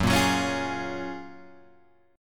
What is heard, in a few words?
F sharp Major 9th F sharp, Guitar Open G